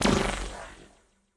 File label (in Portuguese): Bola pequena Raio